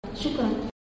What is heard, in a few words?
loop